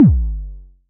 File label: Short, Kick, Traditional; Multi-Kick; Long